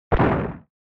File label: Shooter Damage; SNES